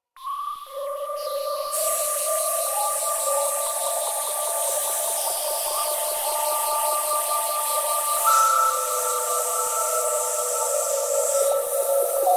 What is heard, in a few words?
Jungle, Eerie jungle ambiance with hissing snakes.; Tropical Jungle; Forests and Jungles